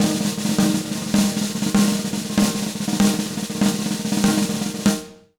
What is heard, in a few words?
100 B BEAT, Jazz Latin Drumloops